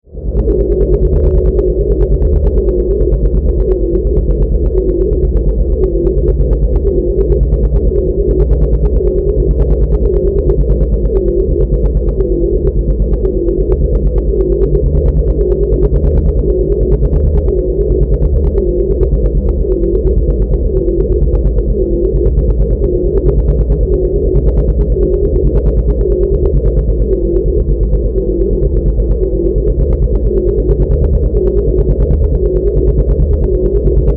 Drone, Alien, Power, Source, Scifi

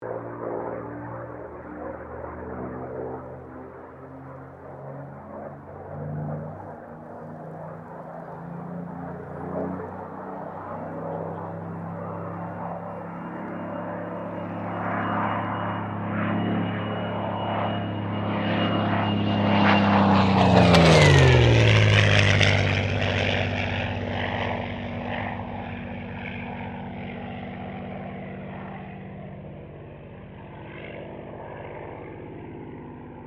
AIRCRAFT SUPERMARINE SPITFIRE MK-XVI PASS; Airplane; Airplanes; Vehicles

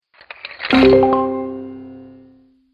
Stone Spell Fantasy Accent, Magic Sound Effects

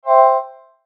Logo Ring Whoosh; Sonic Logo Sound Effects; Production Element Imaging Element Accent Transition

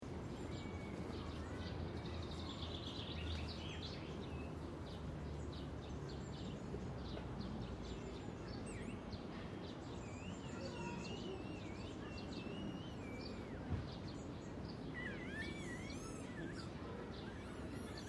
City Spring Birds, Baby crying and birds singing; Distant city recording from park in Stockholm. Baby crying and birds singing. Some voices in Swedish. Pleasant park ambience, springtime; City Sound Effects

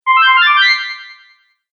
Fantasy Magic Accent; Magic Sound Effects

